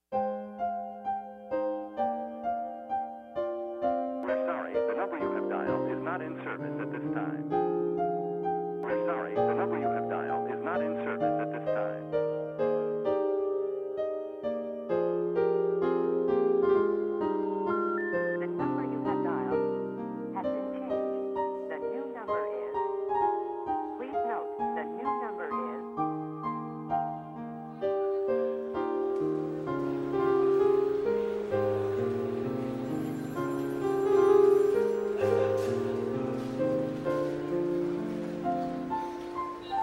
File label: bgm piano